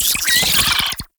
Oraxia Brood Spider Vocal; Warframe

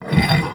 Cast Iron Slide; Gameplay; Awakening Remaster

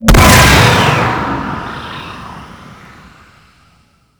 Paladin Smite; Warframe

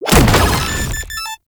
Proto Infested Brachiolyst Teleport Stop; Warframe; Abilities

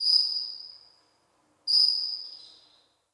Cave Ocean Creature Far

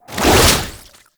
Underground Attack Tentacle Swing; Warframe; Abilities

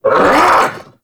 Vocal Attack Short; Warframe; Duviri; Dax

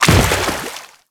Grineer Step Water, Footsteps; Enemies; Warframe

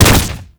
Arcade Automata Impact; Warframe; Nineteeen Ninety Nine; Deep Archimedia

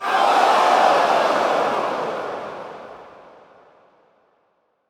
Point Missed Crowd, Lunaro Crowd

